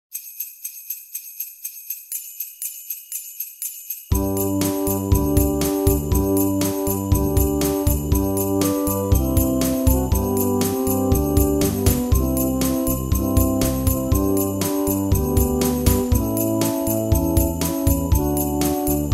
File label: Jingle Bells